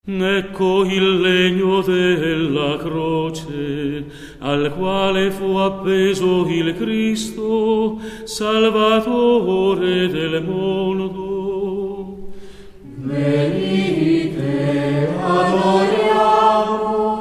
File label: Canto Gregoriano; Ecco il legno della croce